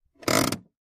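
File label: emergency brake set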